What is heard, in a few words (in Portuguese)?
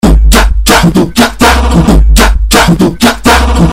batida funk